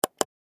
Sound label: Like and subscribe button